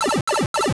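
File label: pipe warp; mario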